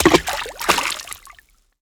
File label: water splash small; video game; Bluezone Corporation